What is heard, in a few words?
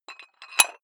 dumbbell put on rattling big weight; sport freizeit; fitnessstudio